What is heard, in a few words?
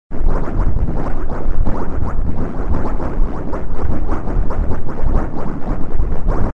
Bubbling